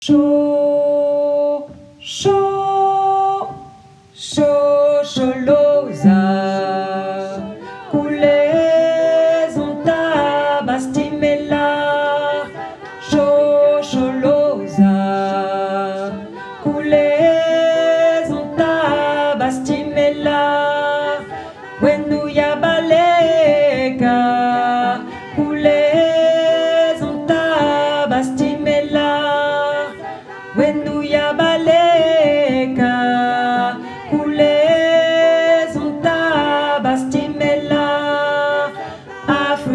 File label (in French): shosholoza voix separees tenor